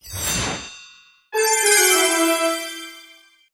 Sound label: Levelup; Cookie Run Kingdom; Castle